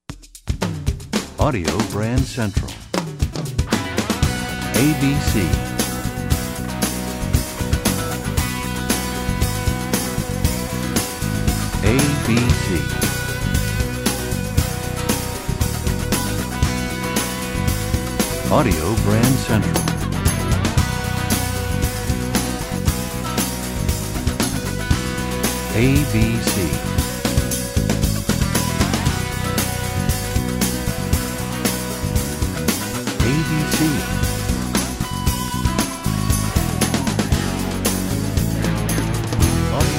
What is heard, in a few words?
Desert Wind, radio jingles